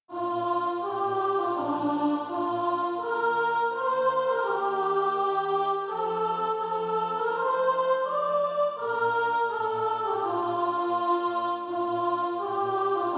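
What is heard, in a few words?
soprano, Sheet music (SATB) including Practise Music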